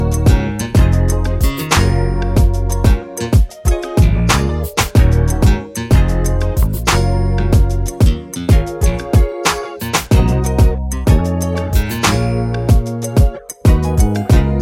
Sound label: single life instrumental